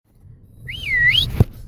Hey whistle

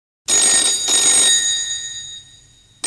Ring

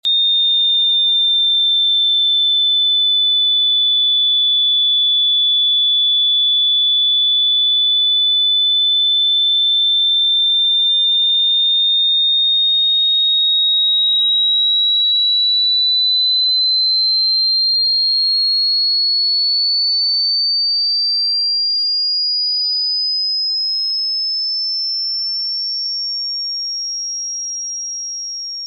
High Pitched

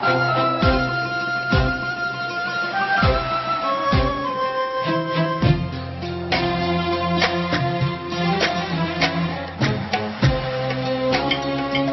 Classical

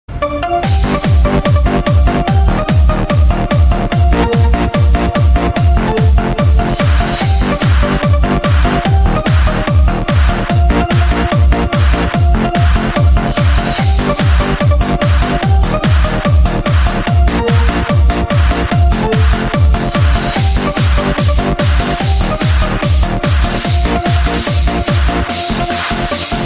techno; Dota

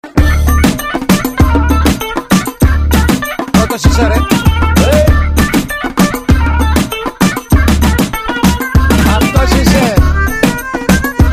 Balkan Folk Music

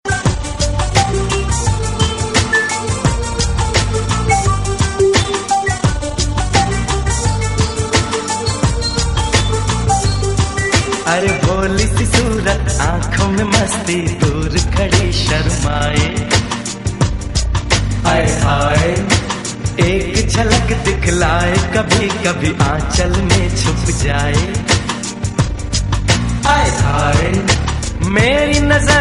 flange Remix

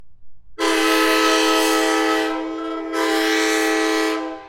Ship Moving

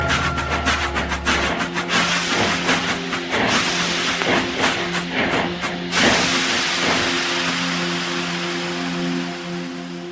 Train Stop Msg Tone

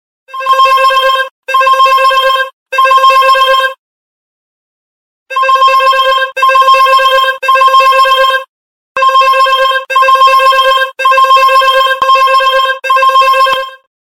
Wake Up Alarm

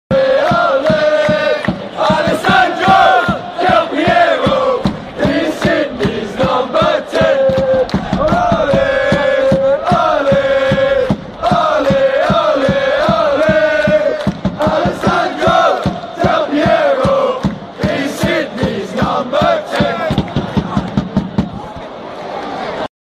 chant; Del piero

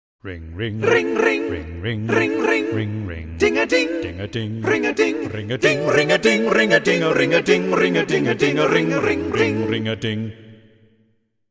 Ring-a-ding

